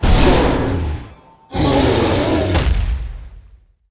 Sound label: Boss Gorilla deth, monster